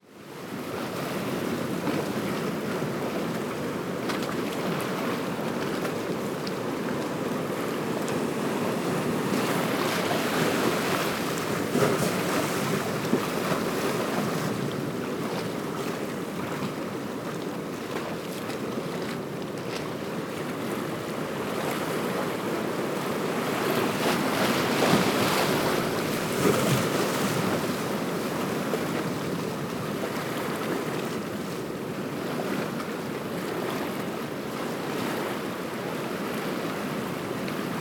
water ocean waves; KriptoFX; WaterSystem2

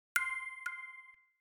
Position up; UltimateFishing2020

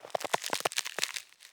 Ice Step On Frozen Surface Crack; UltimateFishing

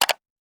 Mouse Click; UltimateFishing2020

